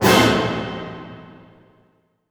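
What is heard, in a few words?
A diminished fortissimo, orchestra hits; Sound On Sound - Peter Siedleczek's Orchestra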